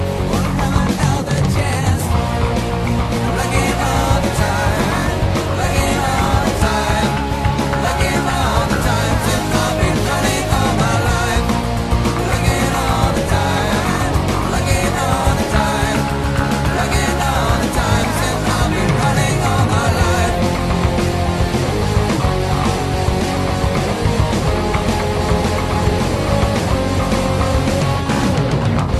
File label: rock song; splinter cell